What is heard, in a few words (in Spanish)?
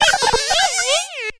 Grito de Combee